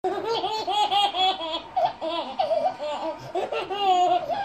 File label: Dirty Chuckle